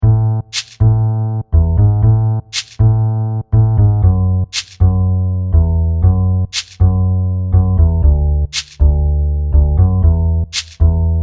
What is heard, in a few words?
bass